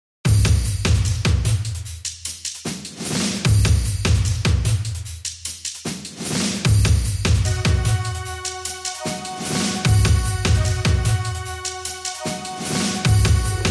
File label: Dubstep